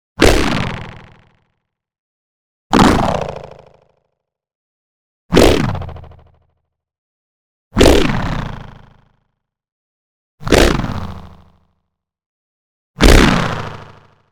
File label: neon hit small vibrating particles; boom library